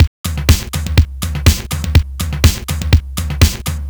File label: Full Drums, 123bpm, Nu Dance Electro; Zero-G; Soundclash; Acidized Wavs; Combi Loops